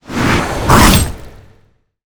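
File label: 4 leg skeleton attack, monster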